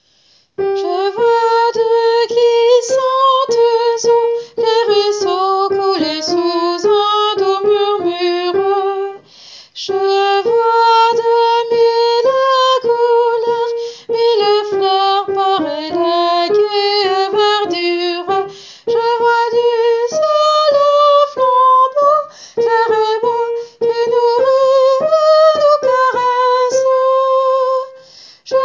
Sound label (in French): je vois de glissantes eaux soprano